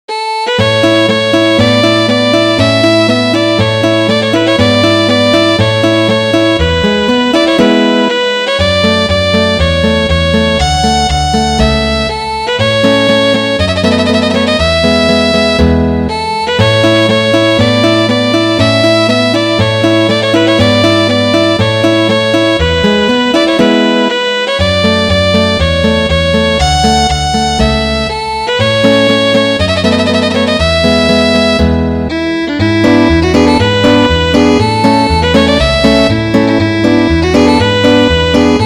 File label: duos; Ferdinando Carulli